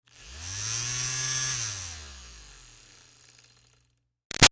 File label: Fan Small Handheld Run Short; Electronics